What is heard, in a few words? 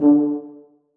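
tuba staccato c#3; Sonatina Symphonic Orchestra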